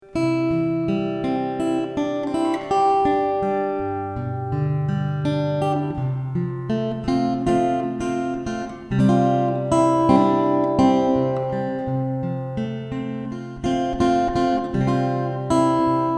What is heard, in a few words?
Instrumental